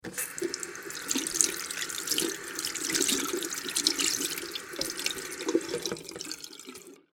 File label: Water Sink Faucet On Run Off; Bathroom (Sound Effects:Household)